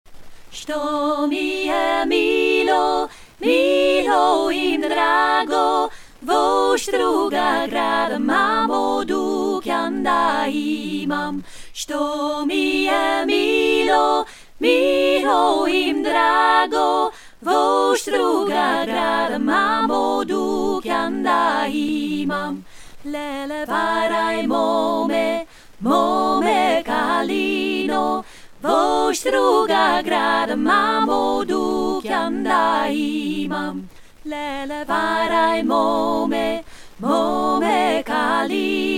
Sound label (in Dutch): sto mi e milo gelijke stemmen